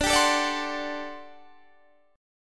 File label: G Major 7th Suspended 2nd Suspended 4th